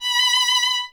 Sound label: STR VLN, Vln Detache; Miroslav Vitous